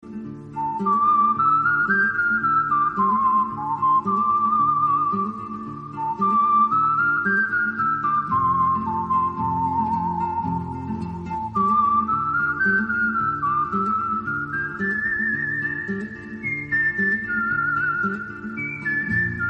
soft suspenseful background whistle tone ringtone; samsung ringtones

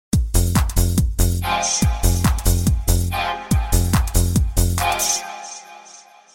sms dance sms ringtone

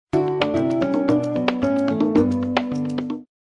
old lg ringtone

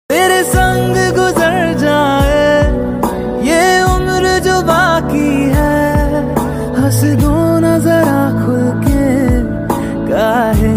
romantic love song ringtone, bollywood; whatsapp status video

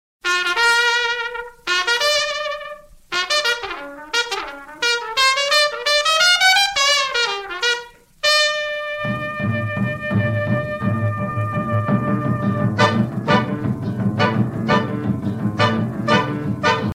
ringtone

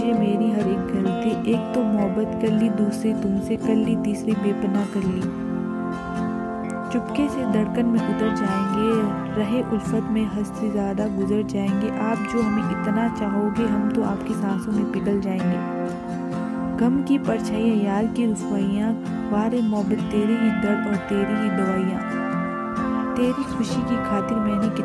fabulous love ringtone, bollywood ringtones

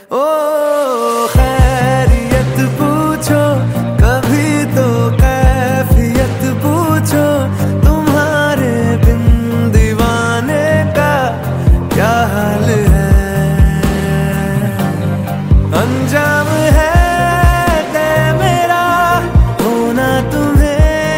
bollywood song ringtone

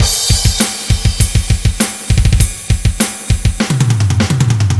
kick beat rock music tone

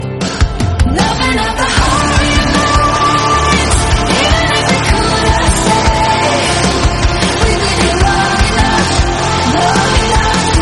sad english song tone ringtone

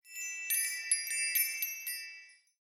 bell ringtone, apple sms ringtones

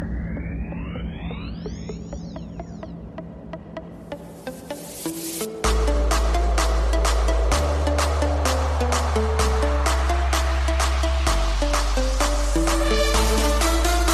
electro ringtone; zeus

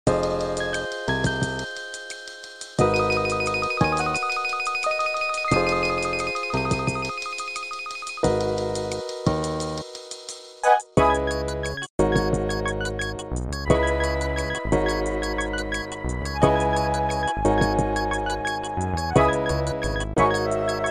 motorola ringtone; nocturne

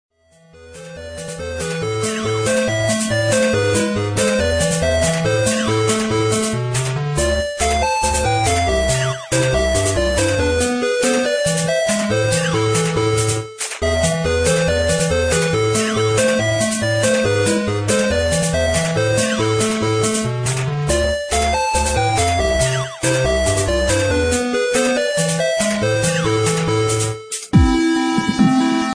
dubstep ringtone; tetris

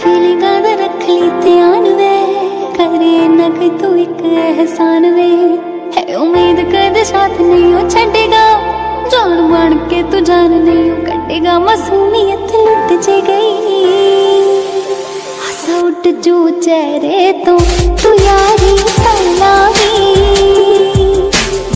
ringtone, bollywood ringtones

